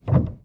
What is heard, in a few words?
piano